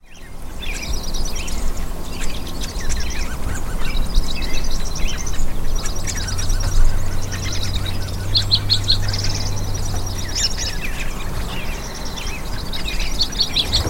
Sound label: birdsong